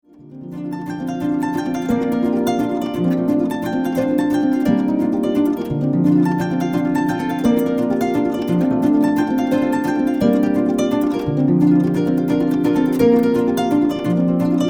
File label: Future Strings